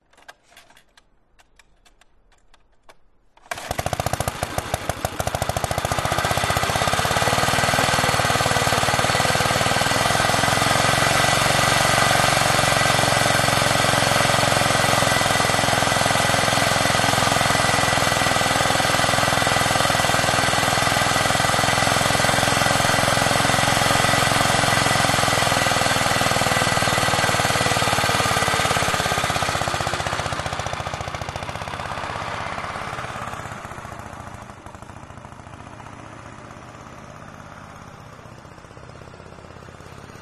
lawn mower vintage ride on British Anzani Lawnrider 24in Start Run Passing; BAL24; selectmood